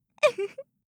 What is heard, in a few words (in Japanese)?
うふふっ, ASMR